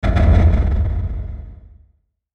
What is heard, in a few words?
Hit Harsh Hiss Delay; Blastwave